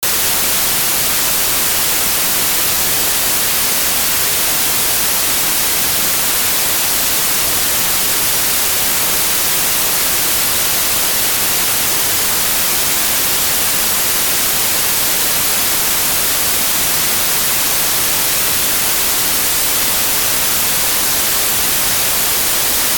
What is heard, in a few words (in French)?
bruit rose